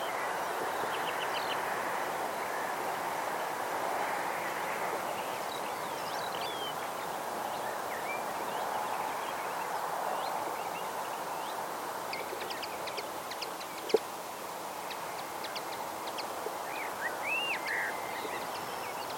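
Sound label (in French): alouette printanière linotte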